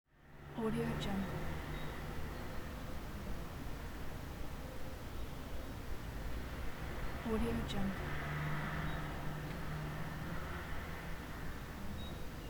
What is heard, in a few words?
peaceful and calm suburban neighborhood ambience; urban sounds